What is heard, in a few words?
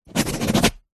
office pen biro write sign signature on glossy paper